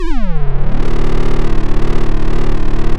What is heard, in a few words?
pop, chainsaw